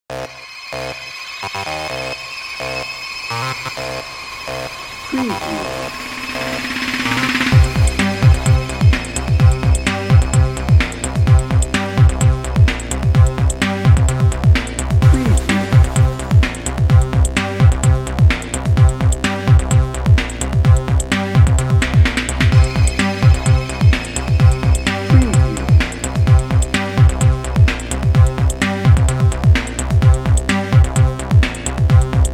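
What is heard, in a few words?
future innovation drum and bass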